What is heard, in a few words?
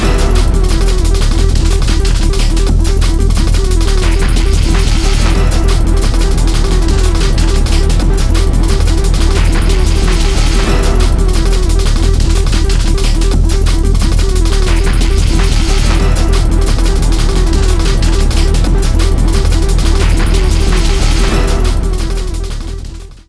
New Challenger; Tokyo Xtreme Racer 2; Dreamcast